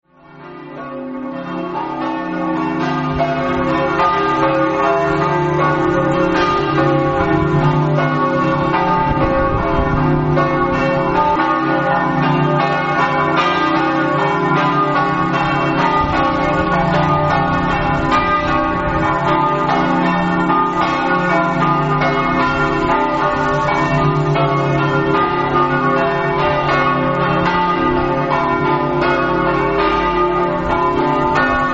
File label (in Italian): campane